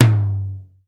hi tom G key, Royality free tom tuned to the G note; Loudest frequency: 670Hz; tuned drums g key